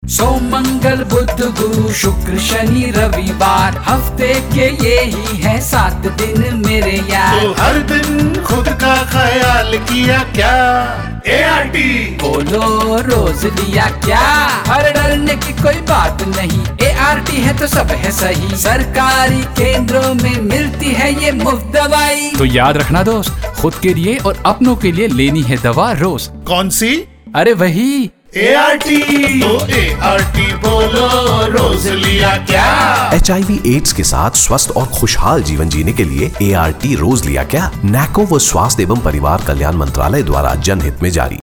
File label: radio jingle